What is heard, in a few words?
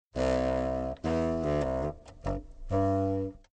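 double bassoon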